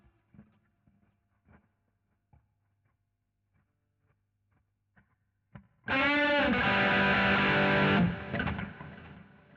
GTR B Solo

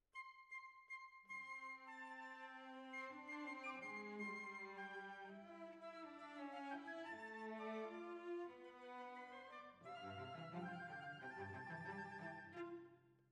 Violas, jazz for piano

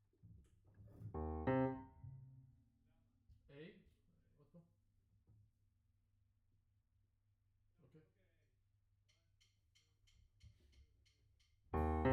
Piano B

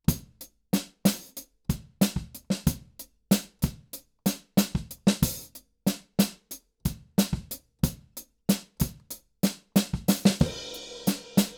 KIT

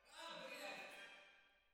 Timp

